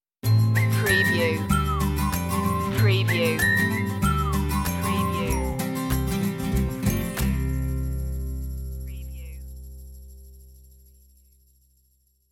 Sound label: hickabilly stinger